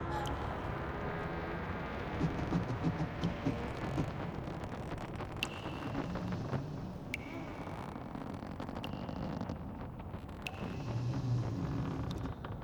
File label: Sea Below Deck Center